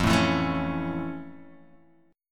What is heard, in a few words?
F Augmented Major 7th F Guitar Half Step Down